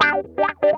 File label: HOT CHOP, Funk Guitar; Zero G - Funk Guitar